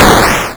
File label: 8bit